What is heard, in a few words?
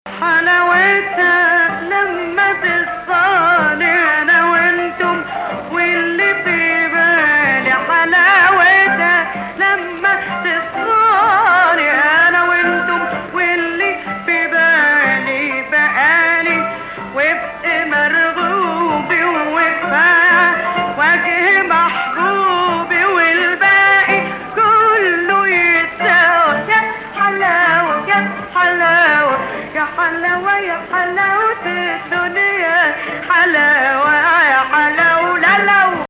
Ajam